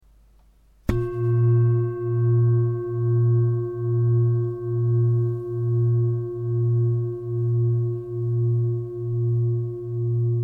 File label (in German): Klangschale; 2028g